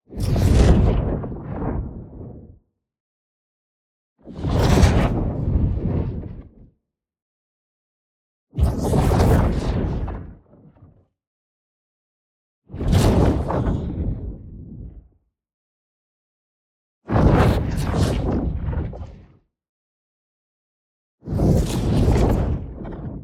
ABILITY RELEASE Thunder Roller; boom library; Matter

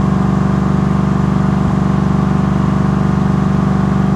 68 Road Runner Loop, Automobiles

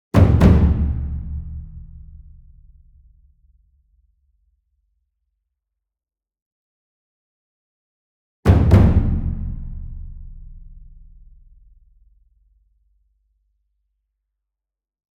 ENSEMBLE DRUM Taiko Double Medium; boom library; Cinematic Strikes Construction Kit

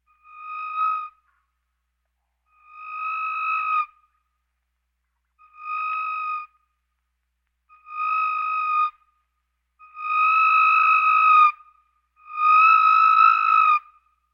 Birds

